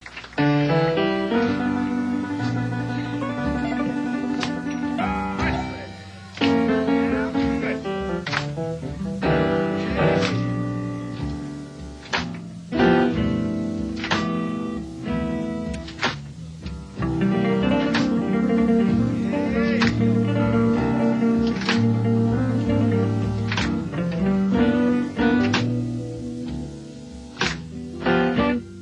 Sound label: Slow Blues Instrumental